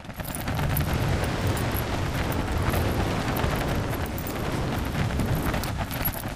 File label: atlas eject pilot descent foley longer; Titanfall 2